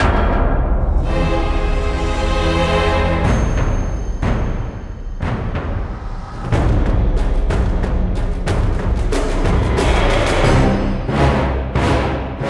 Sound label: Combat - Cinematic